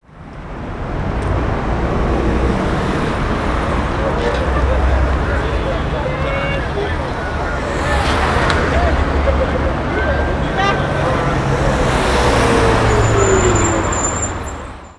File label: stadium